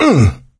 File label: hurt minor; voice; source engine; left 4 dead 2; survivor